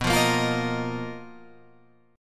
B Major 7th Flat 5th B down, chord shape

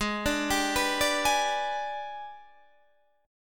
Gsharp Minor Major 7th Flat 5th, Guitar, Open D; 18,17,17,17,17,18

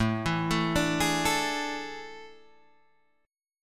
A Major 7th Suspended 4th, Guitar, Open G

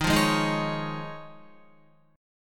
Eb 7th Suspended 2nd Suspended 4th Eb, Guitar; strummed

